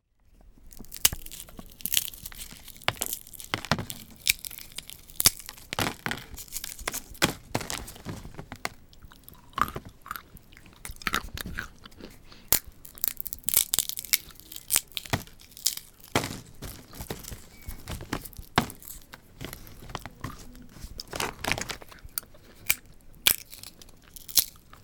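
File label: peanuts; 落花生; 茅野市民館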